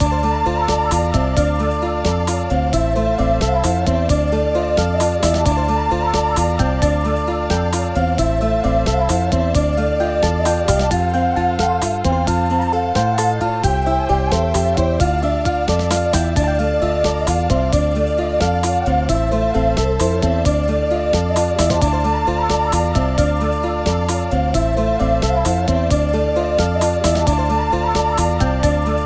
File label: Flute; Fresco Wizard; Windows; Flute Fantasion